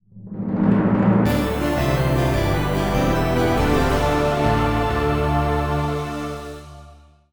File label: fanfare pvp lose; Spiral Knights